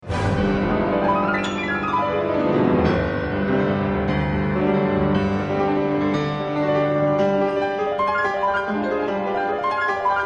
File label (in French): concerto pour piano, allegro; RACHMANINOV